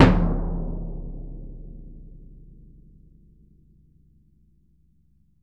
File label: BS DRM FF; ILIO; Synclavier Percussion 2; World & Orchestral